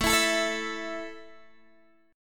A 5th A down Guitar Open D

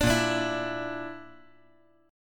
A sharp Major Flat 5th; strummed

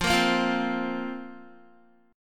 F Suspended 4th F, Guitar; Fsus4; strummed; 12,13,10,10,12,x

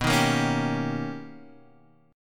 B Augmented Major 9th B Guitar DADGAD

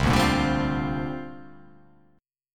C 7th Suspended 2nd Suspended 4th C down; C7sus2sus4 strummed

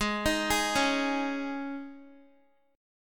Ab Suspended 4th, Guitar; arpeggiated; Absus4; x,11,13,13,13,x

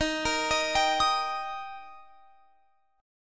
Eb Major Eb down Guitar Open F; arpeggiated; JGuitar

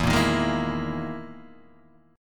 E Major 7th Flat 5th down Guitar DADGAD

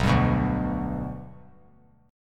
B Minor Sharp 5th; strummed; JGuitar

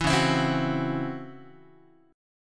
C sharp Minor Major 7th Double Flat 5th, Guitar; C#mM7bb5; strummed; JGuitar

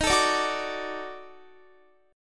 Eb Minor Major 7th Flat 5th Eb down Guitar; EbmM7b5; strummed

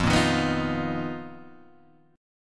B Major 7th Flat 5th F, chord shape

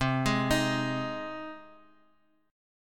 C Minor Sharp 5th, Guitar, Open F, Cm#5 chord; arpeggiated; JGuitar

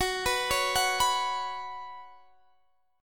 F sharp Suspended 4th, Guitar, Drop D; x,21,21,18,19,19; down

